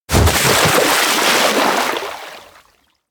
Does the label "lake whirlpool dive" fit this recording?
Yes